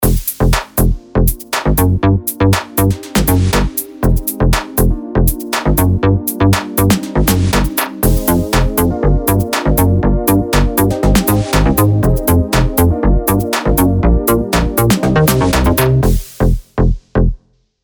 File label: Bass Sequence; EIII; MINI TRACK